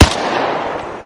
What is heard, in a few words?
Rifle; MilkChoco